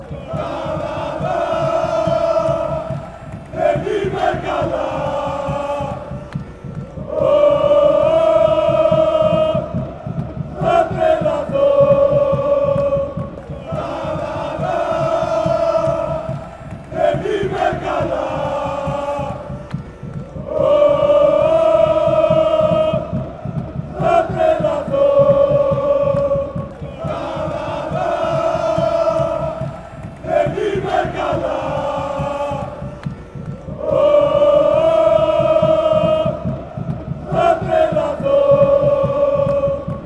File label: aris chant